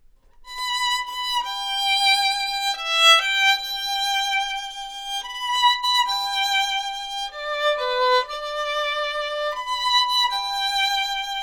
violin Tenderness

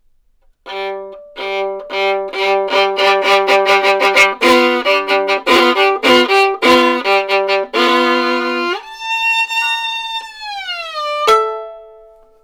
violin Frustration

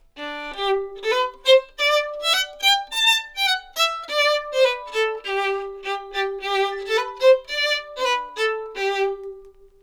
violin Happiness

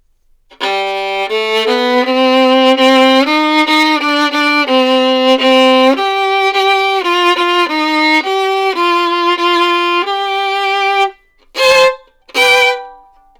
violin Anger; Analysis of Emotions in Musical Expression

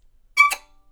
violin samples Surprise

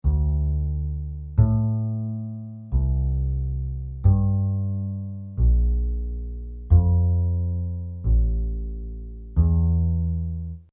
jazz

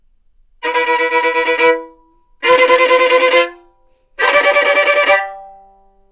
violin Anger

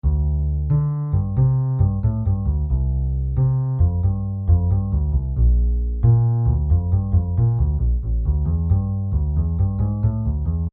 jazz

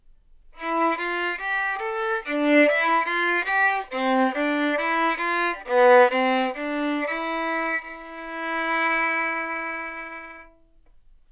violin samples Tenderness

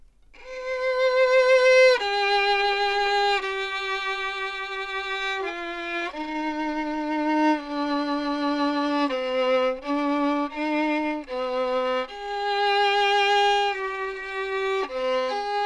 violin samples Sadness

